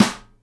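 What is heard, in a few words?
snare nylon damp, Live Snares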